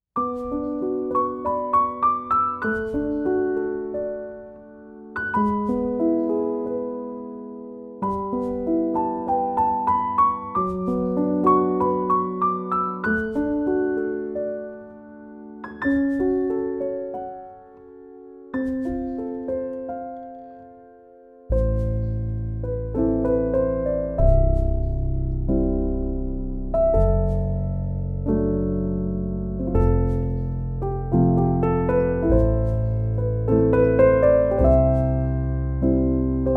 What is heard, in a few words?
Piano